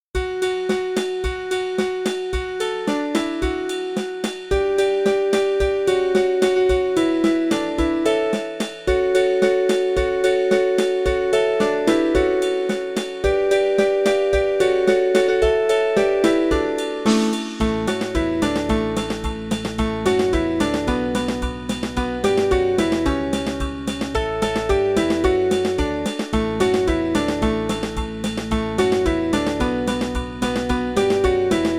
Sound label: Jingle Bell